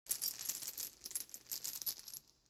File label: tripalium chain; ambience room torture